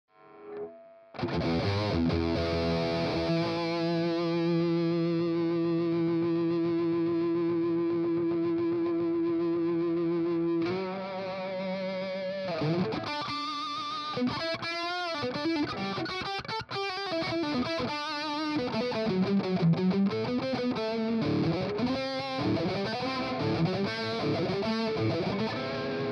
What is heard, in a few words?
Fryette lead